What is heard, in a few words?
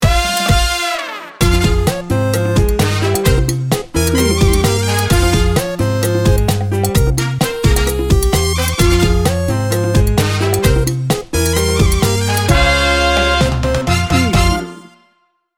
sultry chica